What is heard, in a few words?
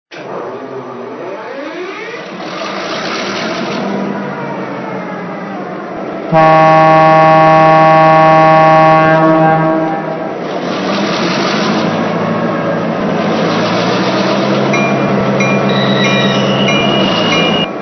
MP15DC